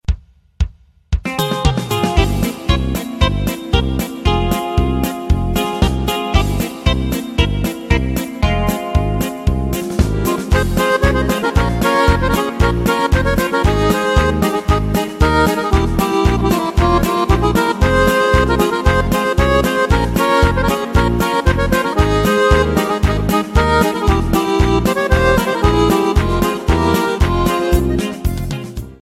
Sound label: romanian ringtone